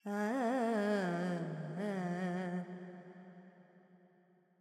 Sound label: Pentatonic, Vocals, Carnatic Female, Alaps, G; 70 G; Sample Banks